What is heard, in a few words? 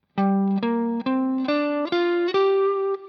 G minor pent shape 3 of 5, guitar